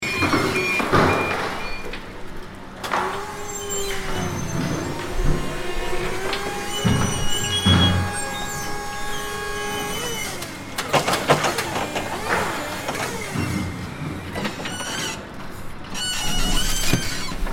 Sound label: Construction site heavy machinery ambience